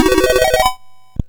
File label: sid MOS6581R4